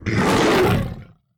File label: monster roar